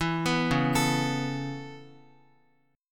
Db Minor 7th Sharp 5th, Guitar, Dbm7#5 chord; arpeggiated; JGuitar

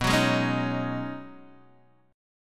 B 6th Add 9th B down Guitar Open G

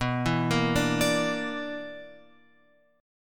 B-Minor Major 7th Double Flat 5th; BmM7bb5 Chord {7 7 8 7 X 10} arpeggiated

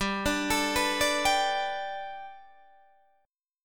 G Major Add 9th Guitar Open D, chord

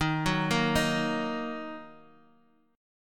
D sharp Minor, Guitar, DADGAD; x,6,4,3,6,x; chordshape

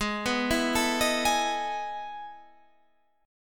E Major 11th chord

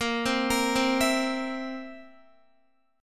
A sharp Suspended 2nd Flat 5th, Guitar; arpeggiated; guitar chord

